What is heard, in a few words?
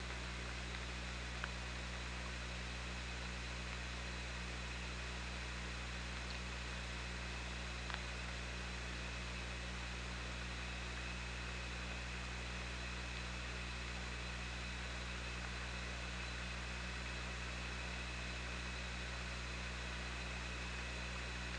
OrcaLab